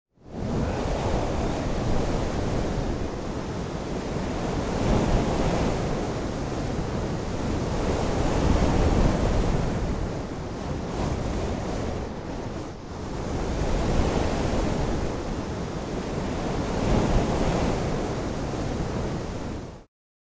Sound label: Cities cape Wind